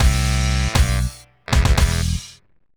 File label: Lose; leisure suit larry magna cum laude